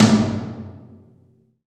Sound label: TOM TOM, Roland